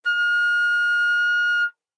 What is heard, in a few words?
Flute nonvib ff F6 stereo; Woodwinds